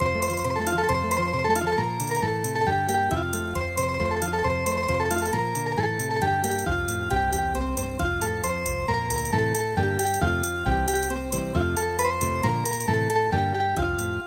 Dance, Flamenco; Shue Makers Dance

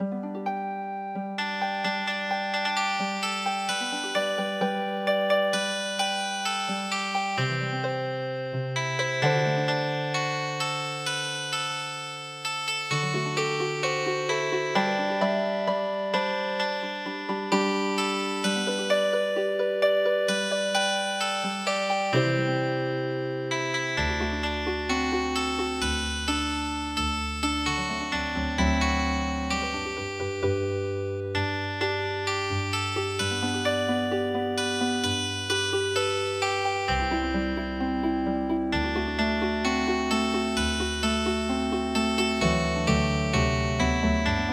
Healing Harp, traditional; The Wabash Cannon Ball

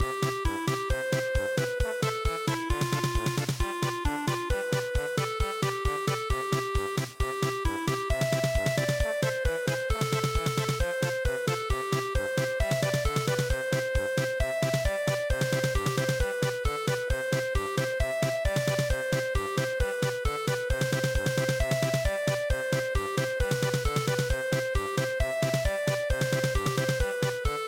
polka; SUTODORA PANPA

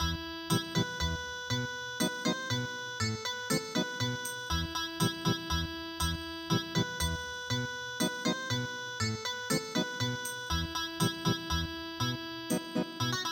Garba Ethnic Guitar

